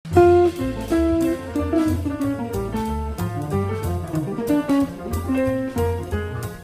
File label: melody